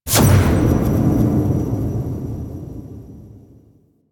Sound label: Choice Menu Confirm; Warframe; Quests; The New War; UI; Table For Two